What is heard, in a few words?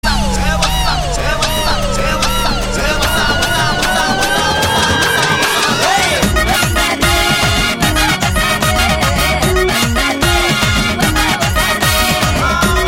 Telugu Ringtone